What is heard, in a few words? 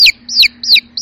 little chik notification